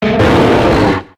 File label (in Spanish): Grito; Terrakion